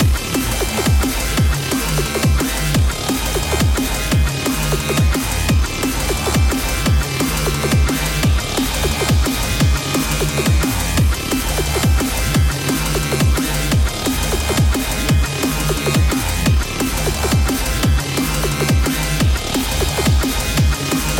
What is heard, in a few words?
bgm desert battle middle boss; world desert